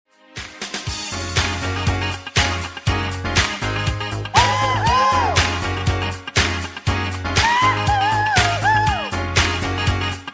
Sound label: kick; band